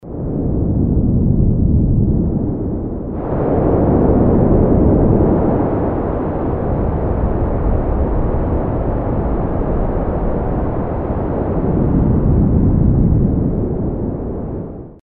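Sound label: window wind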